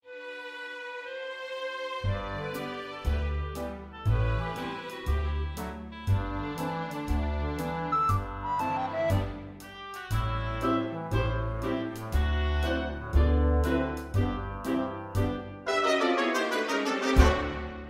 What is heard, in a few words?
instrumental